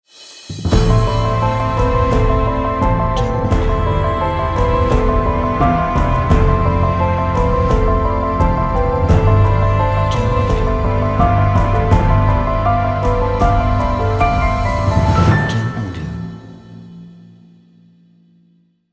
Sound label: Cinematic Trailer Intro